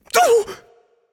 ouch; smite; tsukuyomi

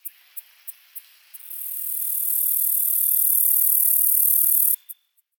ambience insect; smite

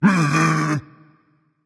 tongue berserker; cuchulainn

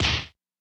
fire impact; smite; poseidon

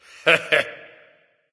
"heh heh"; smite; voicepack; ullr

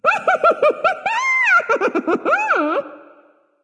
yay; smite; izanami

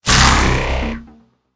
projectile; smite; god raijin

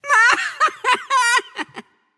laugh; smite; voicepack; khepri

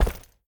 footstep; smite; gilgamesh

